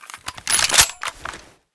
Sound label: galil misfire, weapons